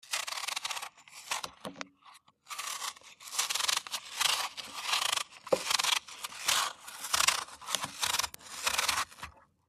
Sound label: Peeling An Apple